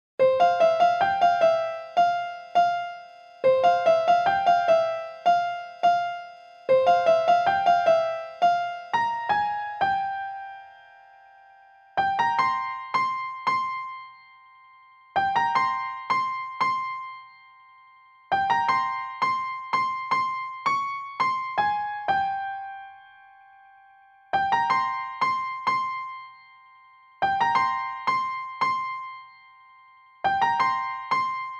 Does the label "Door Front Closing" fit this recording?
No